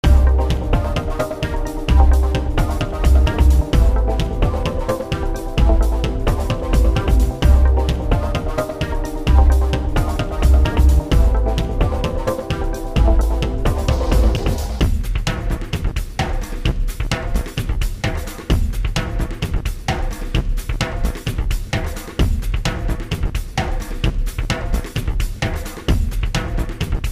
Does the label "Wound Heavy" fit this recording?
No